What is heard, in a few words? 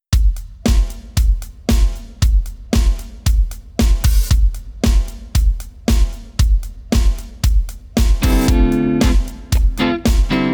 blues 432 hz; happy time